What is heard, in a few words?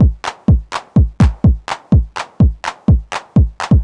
drum stripped 125, Classic Chicago House Drum Loops